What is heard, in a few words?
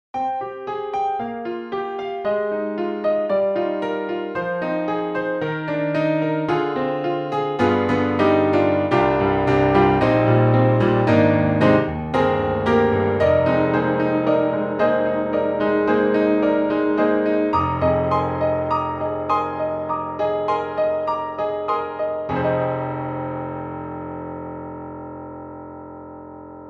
Bright Rock Piano; Hybrid